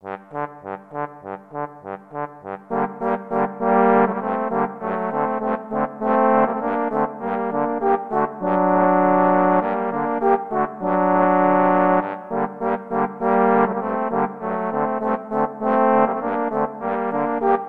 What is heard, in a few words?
trombone trio